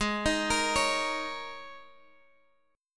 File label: Ab Suspended 2nd Suspended 4th chord, Guitar, Open F tuning; Absus2sus4; arpeggiated; chordshape